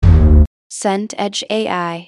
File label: tom low